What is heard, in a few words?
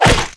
claw hit; xenomorphs